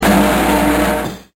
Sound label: drumroll